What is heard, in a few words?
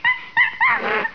CHIMP, animal; 动物